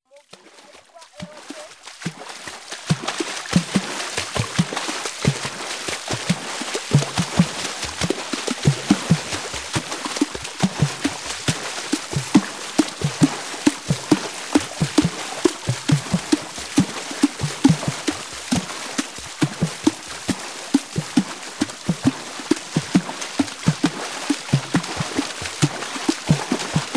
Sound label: Water Drums